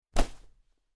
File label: Cloth Character Movement Foley Whoosh